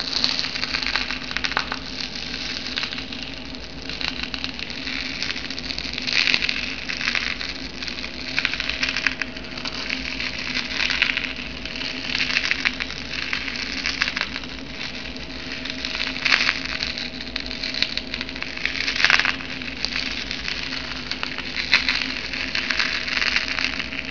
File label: fire; openffa